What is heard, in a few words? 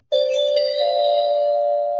turn start; Embalming Girl